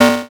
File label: Multimedia Internet CD-Rom Flash Buttons Electronic